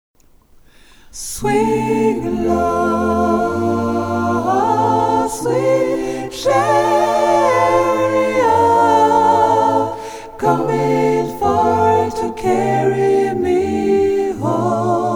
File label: intro sop, Gospel; swing low